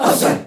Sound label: v perc, vocals